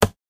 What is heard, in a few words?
Wood; Timelock VR